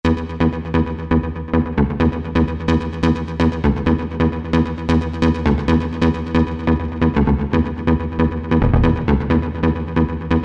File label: Bass; Cheetah MS6